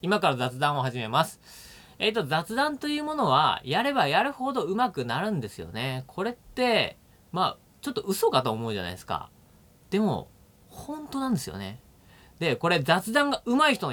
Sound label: Vocal Rider